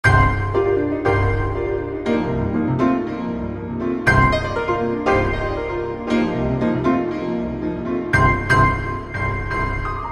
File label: Requium; xv-5080; SRX